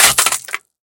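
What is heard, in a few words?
rifle drop glass; STALKER; IWP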